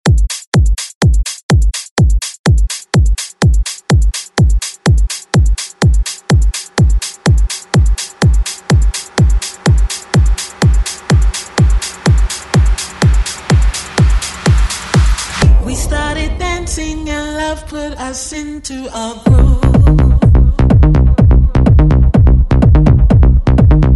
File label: ReDrum; DJ Mhark